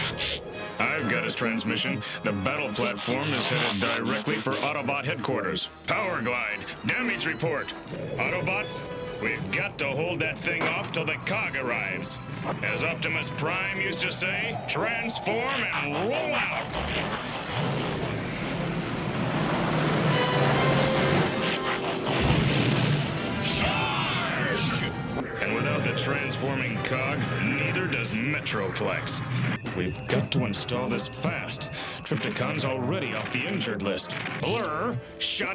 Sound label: pipes